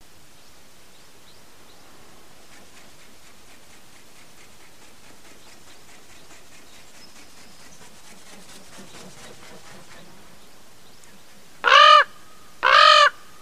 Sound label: Common Raven Flight Calls With Reverberance, Preceded by Wingbeat; alaska acoustic highlights; BIRDS